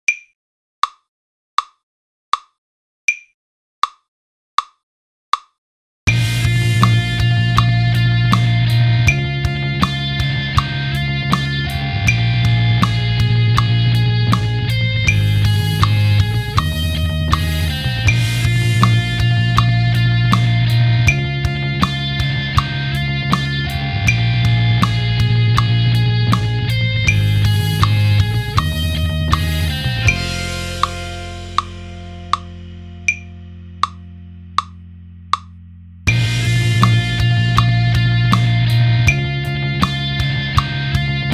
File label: More 4 bar Phrases @80